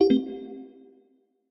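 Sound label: received mail notification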